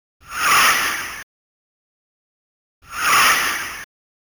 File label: Skid